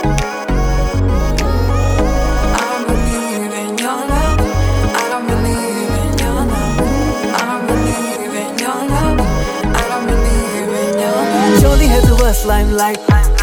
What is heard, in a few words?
bollywood ringtone